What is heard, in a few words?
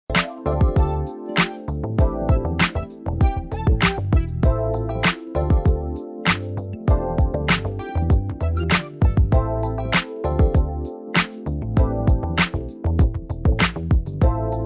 dancing beats